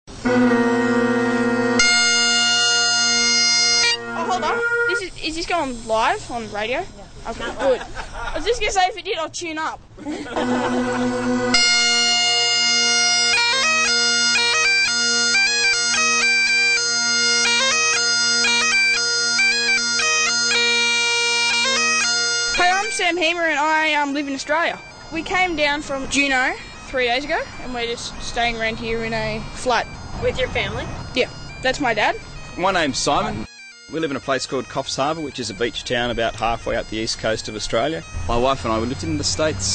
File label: bagpipe